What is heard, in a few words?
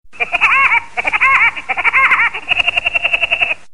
Funny Laugh